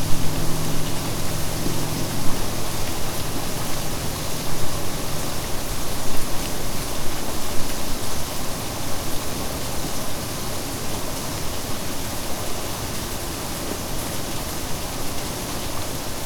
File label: ENV EXT rain